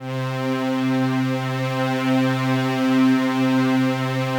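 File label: PAD, atmospheric pads; Best Service; XX Large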